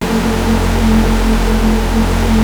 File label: Prophet 5 F2; Hollywood Edge - Giorgio Moroder Rare Synthesizer Collection